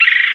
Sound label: woodpecker